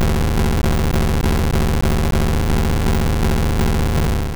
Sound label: FLAMETHROWER; sfx; attack moves; pokemon; gen 2; gsc